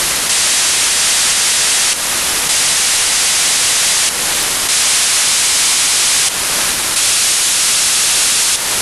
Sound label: 436 MHz 9k6 BPSK Codec2 beacon, satellite; BY70-2 436MHz200; On November 17th 2022 at 21:35 UTC Daniel DL7NDR received on 436.200 MHz the 9600bd BPSK b